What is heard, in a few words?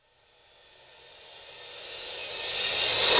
Sweeps Medium